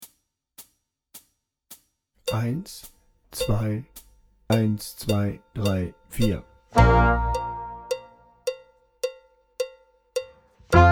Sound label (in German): Rock ohne Drums langsamer